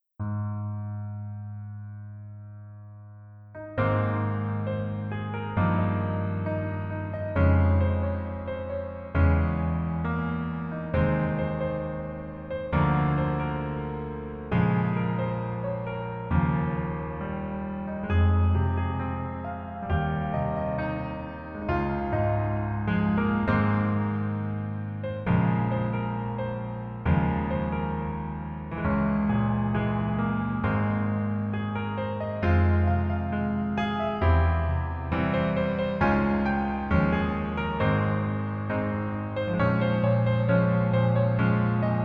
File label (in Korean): Piano ver 멜로디MR 남자키